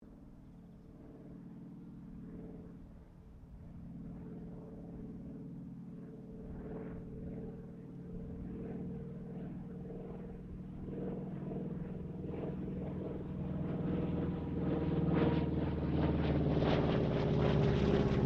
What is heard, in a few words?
AIRCRAFT T-28D TROJAN PASS